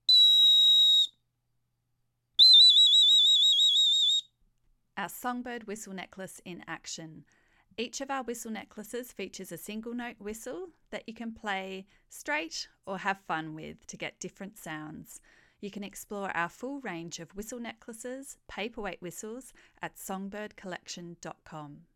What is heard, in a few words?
songbird whistle, spotted pardolote; whistle necklaces